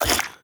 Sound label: breath out, headcrab black; creatures